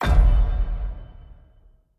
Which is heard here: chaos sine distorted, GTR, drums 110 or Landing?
Landing